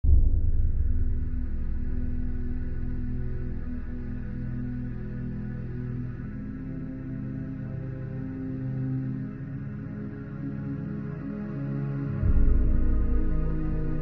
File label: light loop; Fibrillation